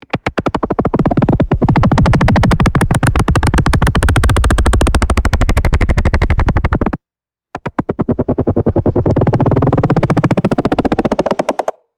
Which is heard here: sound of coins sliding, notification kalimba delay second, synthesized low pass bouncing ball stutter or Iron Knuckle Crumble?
synthesized low pass bouncing ball stutter